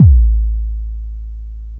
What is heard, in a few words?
Bassdrum; rainbow laser disco dungeon